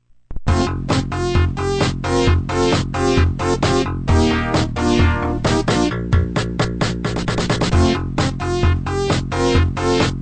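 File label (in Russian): мелодия из 90-х; nhl 94 god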